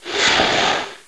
Bat (Hit); Death Blade; Creatures

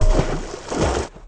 washer; The Interactive Alphabet

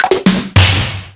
snare; Amiga-CD 1996 #5-6; sampler; Instruments; Amiga Magazin

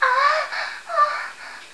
MOAN

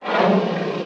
rabbit; The Interactive Alphabet

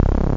mirage requiem synth; OctaMED SoundStudio V1

